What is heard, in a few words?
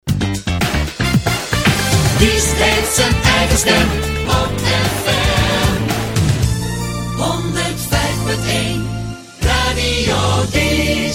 jingle; Diest; Eigen Stem